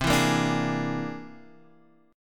C Augmented 9th; C+9 Chord {8 7 8 7 9 X}; strummed

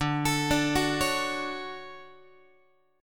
D Major 7th, Guitar; arpeggiated; JGuitar

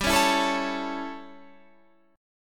A Major G; A/G strummed

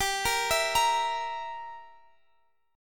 G Minor 6th, Guitar Half Step Down

